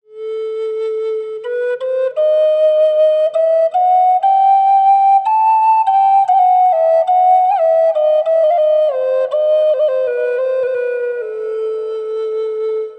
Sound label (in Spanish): hiyaz A, Flauta Nativa, Roble; flauta de medicina; varias tonalidades